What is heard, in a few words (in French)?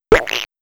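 Biscotte, du son cartoonesque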